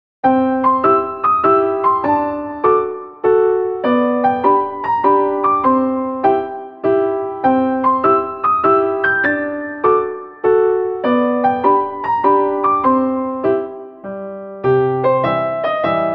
Puppy Walking

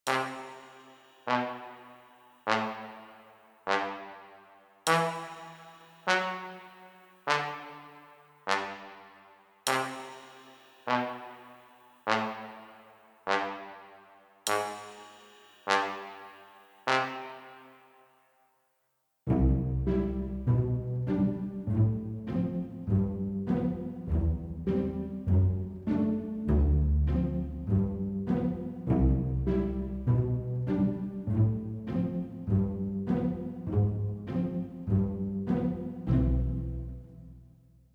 Brass bass; COMIC SLOW

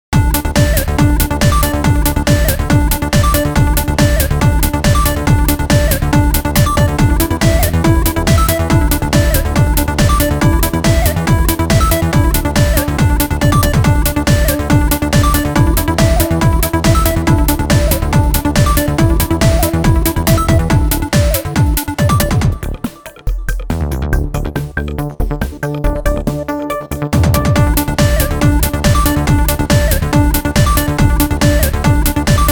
Electronic, EDM; 리듬

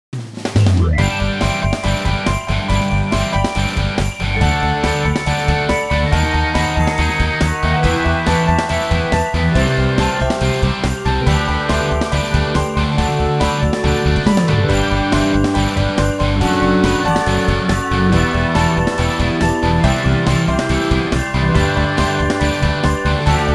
Christmas Style

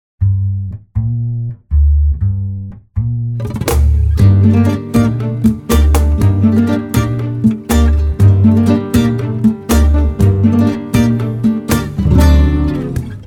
Rumba, Comic 드라마

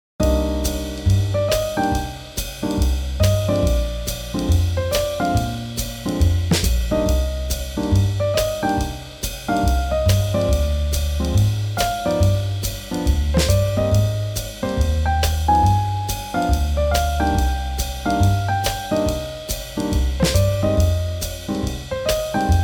Swing Jazz

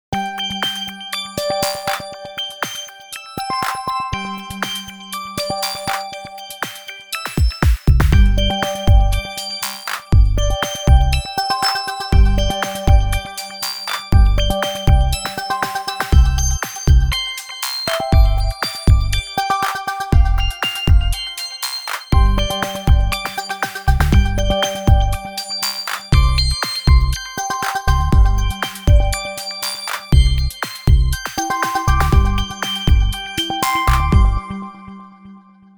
Bright, EDM; 리듬

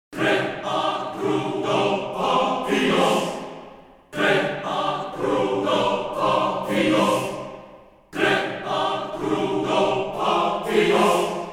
Classical Choir

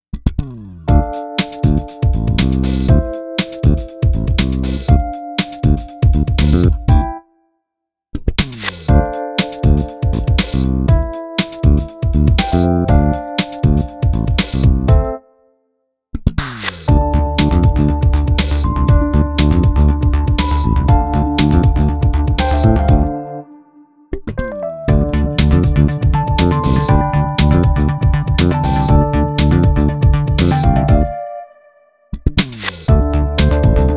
Funky

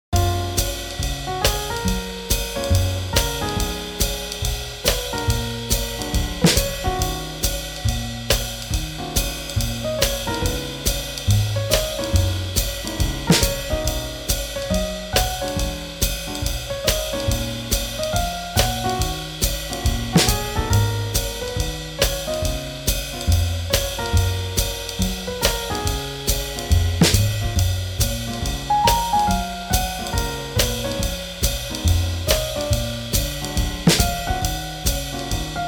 Swing Jazz

